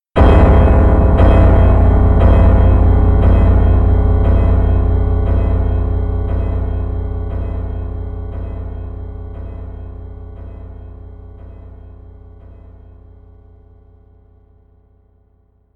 horror piano chord

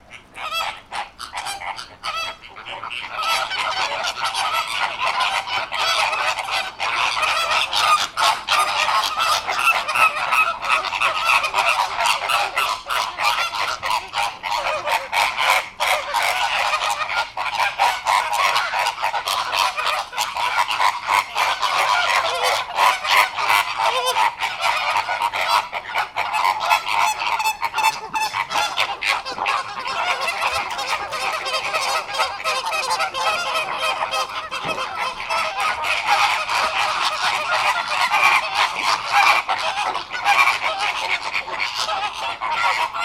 flamingos at zoo; 動物園フラミンゴ群１; animals

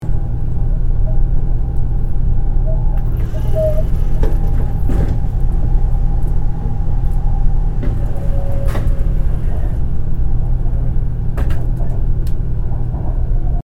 on Shinkansen

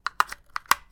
batt into clicker; electric

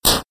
damage; attack

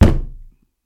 hit table; knocking

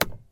dome lamp; car

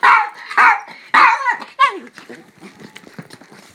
play with me, animals

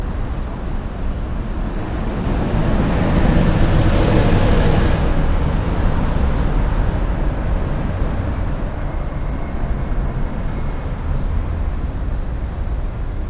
trucks

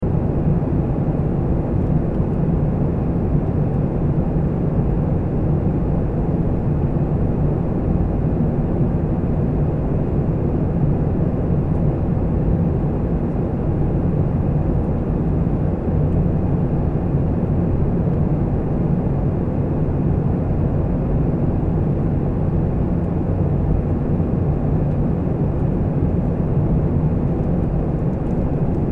on airplane

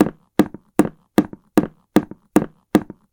walk floor shoes; human

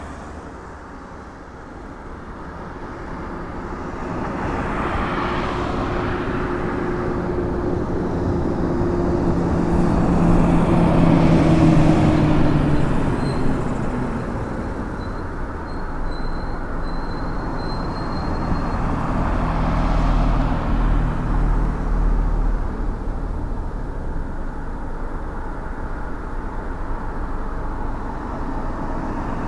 car streets at night

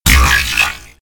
electric chain

